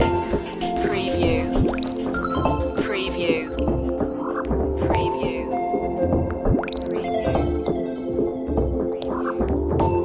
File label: retro video game sweet action loop